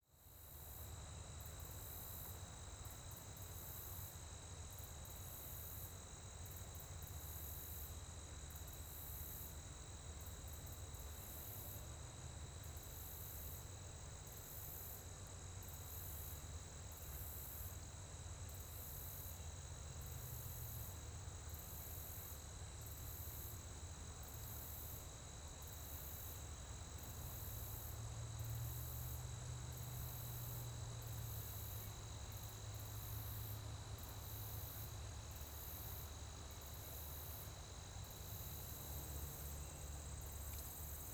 Ambience Nature Trail 180; AdobeCC

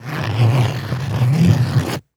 Cardboard Keys Scrape Cardboard Cut Open Box; household

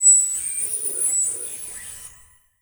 Title Transition Digital Readout; Adobe; Production Element

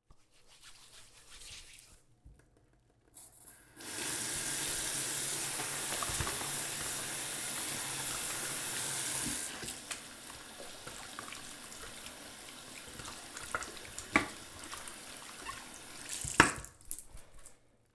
Human Male Soap Up Wash Hands Fast

